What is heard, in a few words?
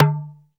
SURUBA, African perc; Greytsounds VOL-1